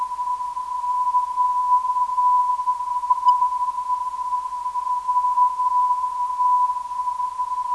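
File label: 315kHz, non-directional beacon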